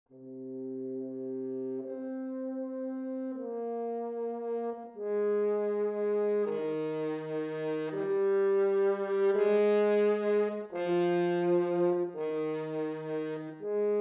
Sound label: Horn